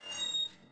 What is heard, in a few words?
squeak; panzer